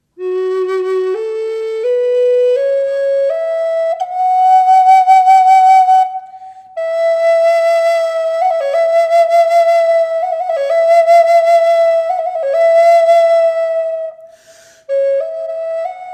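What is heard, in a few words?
reverb, flute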